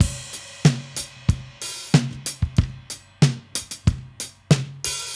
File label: drums crash, analog funk; Mixed Berry Jam; Sonic Foundry (Sony Creative Software) - What it Is! 70s Analog Funk